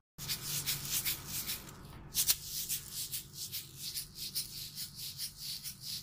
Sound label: shaker